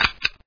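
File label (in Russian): глухой звук одиночного нажатия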